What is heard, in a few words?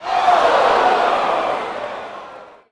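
Boxing Crowd Small Reaction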